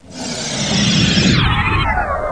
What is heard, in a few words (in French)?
faibli; Emme Arc En Ciel Et Le Lagon Merveilleux